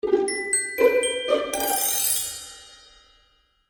Magical Music Finale, Cartoon Music Sound Effects

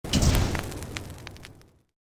Created Magic Spell Fireball Impact

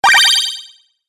Classic Delay Game Hit, video game sound effects

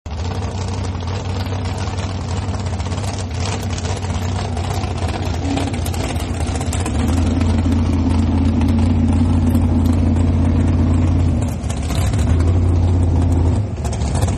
Hot Rod Pulls Up Takes Off, Cars; Vehicles

